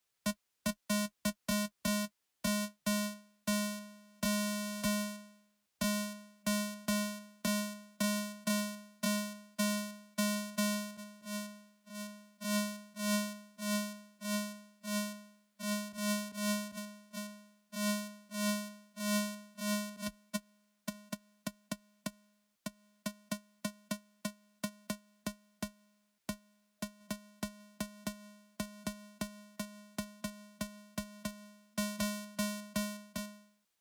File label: Samples for workshop Envelopes; shaping sounds